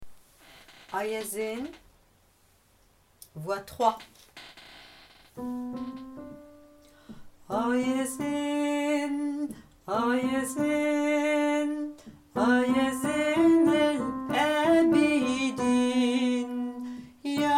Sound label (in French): ah ya zen voix alt bas